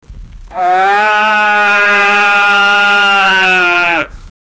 goat bleating loudly

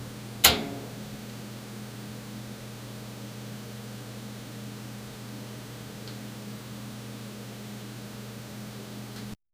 the sound of silence insi, bulb

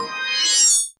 glowing crystal chime ris; magical shimmer

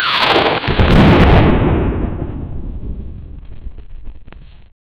lightning explosion; electric

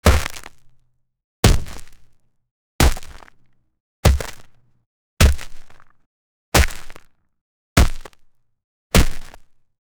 footsteps deep stomps wit; floating

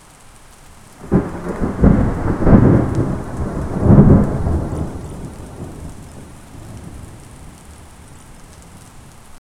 rain

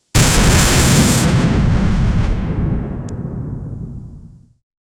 high voltage storm; arcing

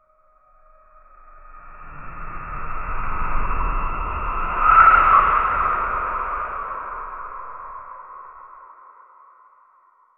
wet dragging mop swish

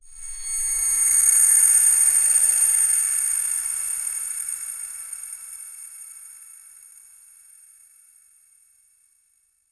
magic splash sparkle rain; water effects

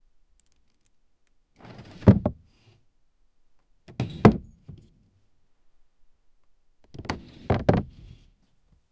wooden chest opening and

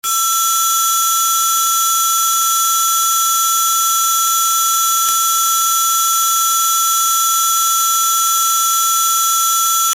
medical device for radiof

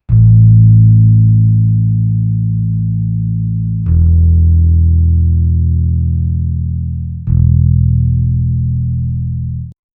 bass bass, electronic music

